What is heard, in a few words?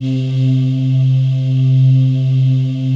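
PAD, atmospheric pads; Best Service; XX Large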